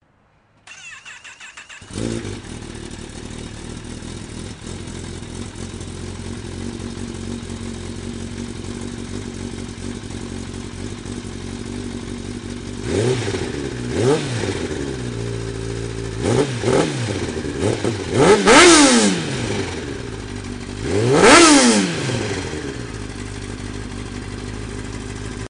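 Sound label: kzx10, exhausts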